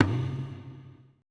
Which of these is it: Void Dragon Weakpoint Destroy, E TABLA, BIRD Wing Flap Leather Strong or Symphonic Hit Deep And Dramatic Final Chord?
E TABLA